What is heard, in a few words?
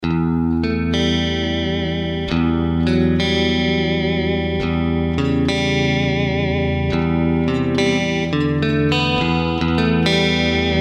Clean